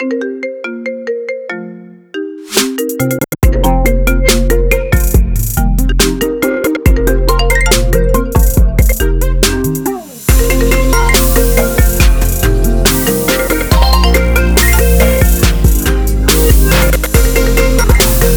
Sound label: Opening Glitch (Ringtone)